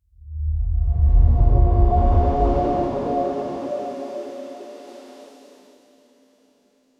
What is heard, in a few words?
cinematic whoosh transit; structure